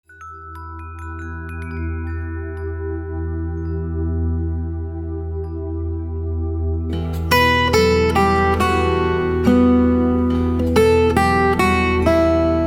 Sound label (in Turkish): duygusal gitar gitar